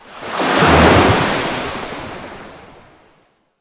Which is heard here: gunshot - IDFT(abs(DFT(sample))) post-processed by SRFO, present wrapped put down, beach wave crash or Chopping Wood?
beach wave crash